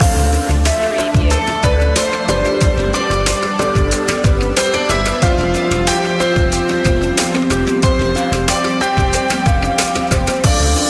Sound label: emotive presence loop